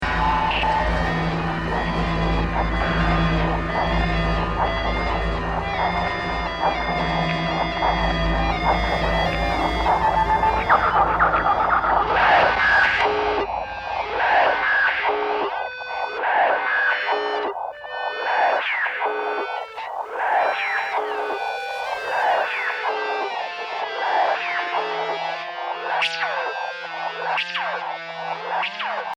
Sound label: Emergency Beacon; transport device